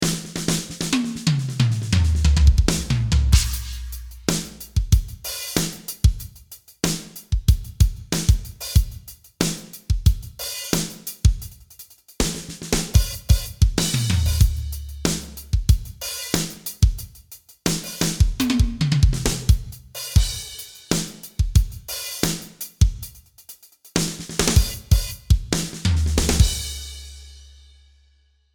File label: Drums